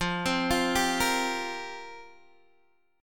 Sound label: F Suspended 2nd Suspended 4th, Guitar DADGAD